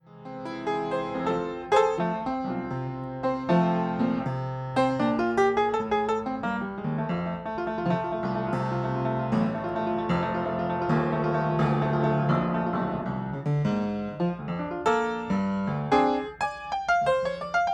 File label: Piano